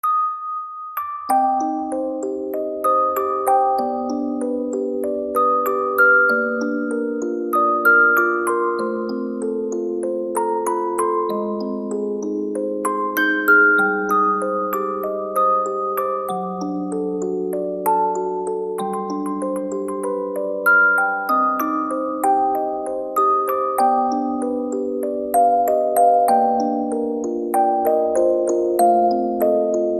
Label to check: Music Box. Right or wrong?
Right